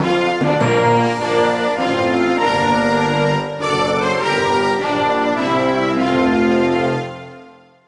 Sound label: Anthem USA; Buzz! The Mega Quiz; PS2